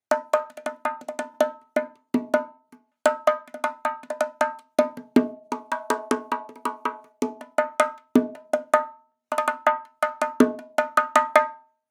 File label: afrobongo loop 80; Slate; SD SEISMIC Drum Sample Pack; Perc Construction Loops